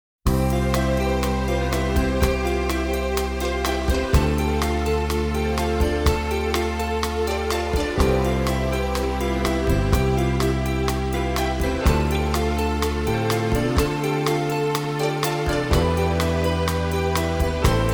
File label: Instrumental